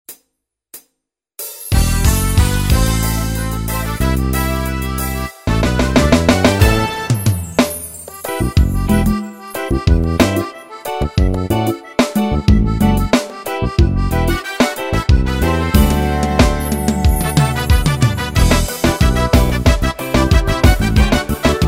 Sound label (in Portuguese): forro introducao